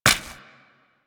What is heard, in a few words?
Step Default, Footsteps